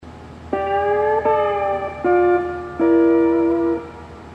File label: hawaiian piece